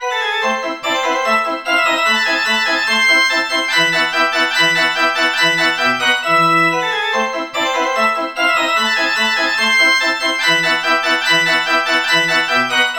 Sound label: church organ